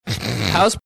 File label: snorting